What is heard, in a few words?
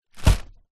balloon foil helium bounce impact